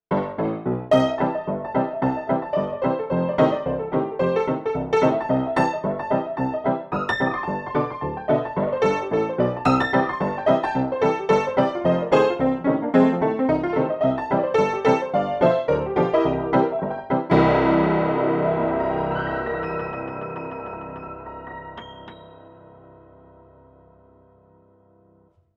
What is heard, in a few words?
far west piano old